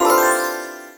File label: Pickup Food Music; the princess and the frog; Orleans